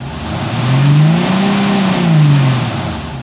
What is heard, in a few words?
Cadillac Rev Loop